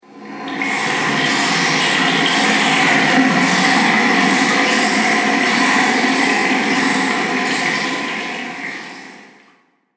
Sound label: Evil Nasties In Cave, monster; Horror; Andrew Fleming